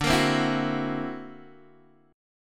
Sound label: D Augmented 9th down Guitar DADGAD